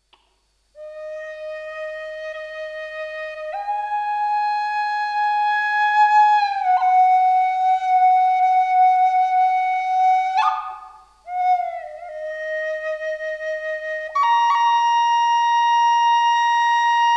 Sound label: Dm 432hz Forest Flute Puriri; Southern Cross Flutes